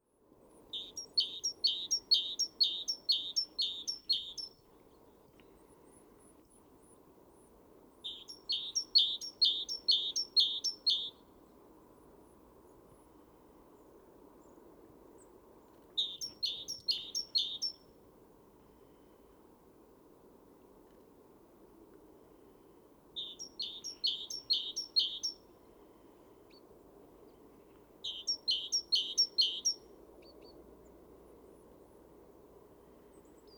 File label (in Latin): Parus major; SETE